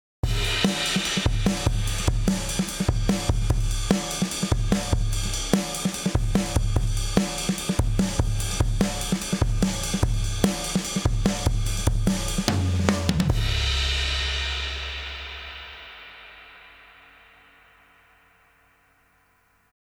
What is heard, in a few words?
Gravity VCA drums; Tierra Audio